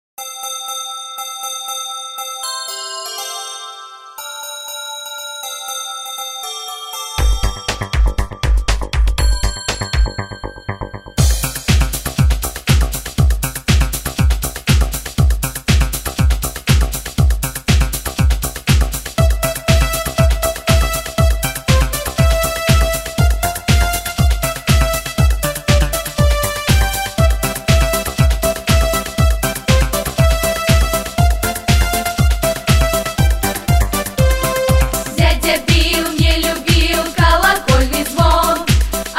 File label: Jingle Bells minus